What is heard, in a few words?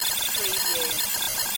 Data Scrambled Readout